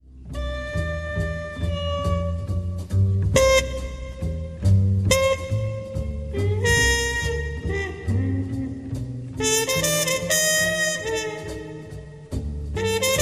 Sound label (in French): trompette